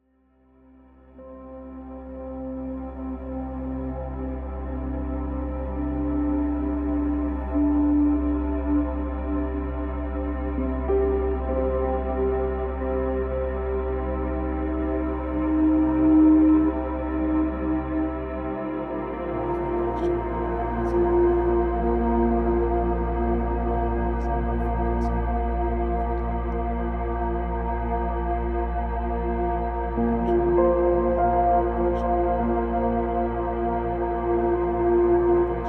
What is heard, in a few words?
Harmonic Union, Love Frequency Soundscapes; TwinFlameGuides